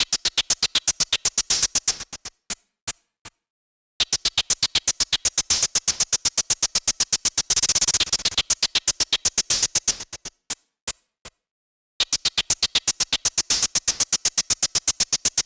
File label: Percussion, Trance NRG, Drums